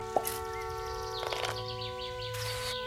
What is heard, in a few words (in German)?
ding; botanicula